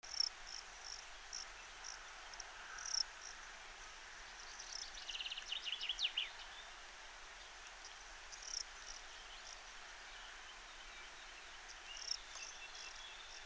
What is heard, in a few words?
Melanogryllus desertus; SO-Steiermark